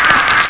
slave pain

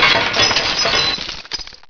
bust glass, debris

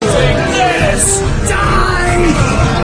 die

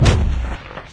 strider step; scmod; synthcrab

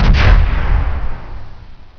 impact

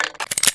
357 reload

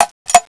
m32 rotate, weapons

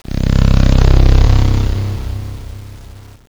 wpn respawn

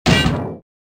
ground hit

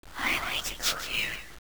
ghost

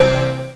powerup

